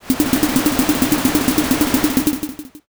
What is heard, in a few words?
carriage